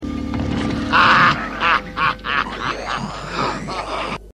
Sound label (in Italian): Soldato nero risata